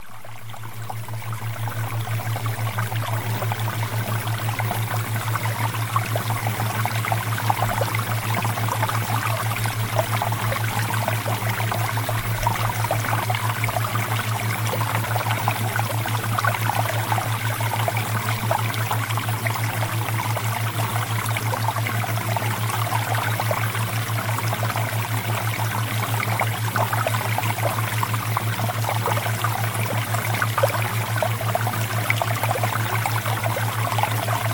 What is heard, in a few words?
Water Theta Waves; Premium Subliminals